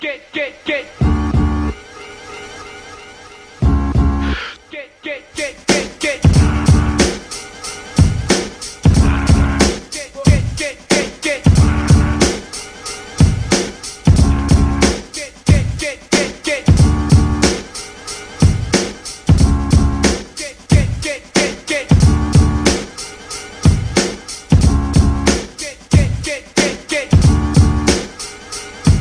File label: black beat, hip hop